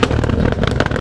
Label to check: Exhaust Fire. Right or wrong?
Right